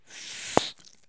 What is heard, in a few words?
Bubblegum Blow; SFX Kit; Foley - Misc